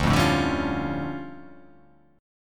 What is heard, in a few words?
D Minor Major 11th, down; DmM11; strummed